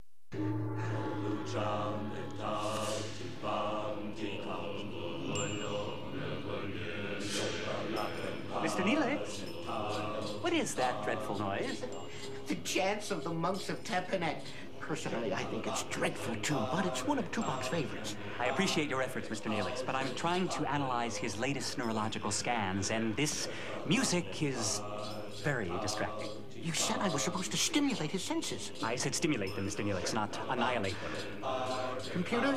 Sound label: Chants of the monks; Temple of T'Panit